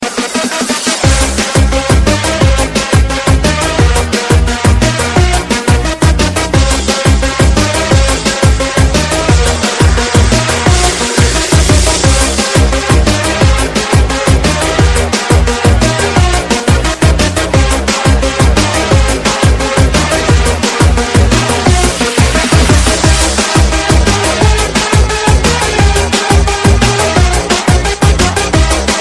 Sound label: fast moves party dance music tone; samsung ringtones